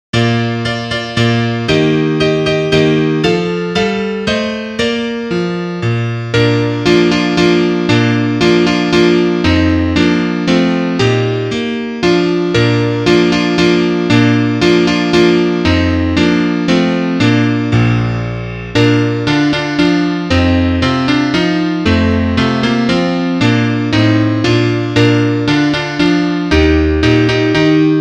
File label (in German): Klavier Solo, trompete; Staccato - Duett Seite 40